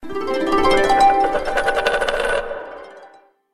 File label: notification; Coolpad 7296s